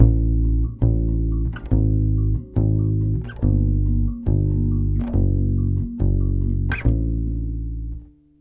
BASS GROOVE, Brazil Bossa; USB Soundscan vol.16

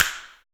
CRAP SNAP; SNAPS

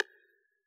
analog side stick; AnlgKit1

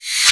REV SHAKE, PERC; NorthStar - The Wizard VOL-2; TSAR PERC

